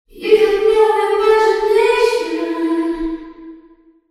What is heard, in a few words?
tunnel reverb female vocals